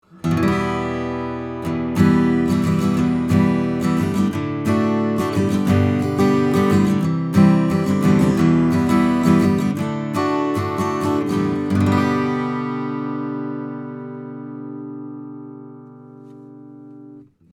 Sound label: cardioid Acoustic Guitar 50cm 15thFret; Neumann U87